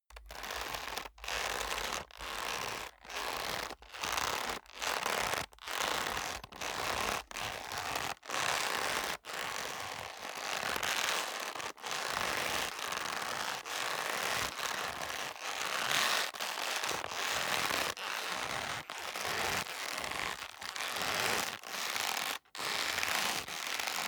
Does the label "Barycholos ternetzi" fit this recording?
No